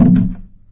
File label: plastic barrel impact soft; physics; Garry's Mod; hl2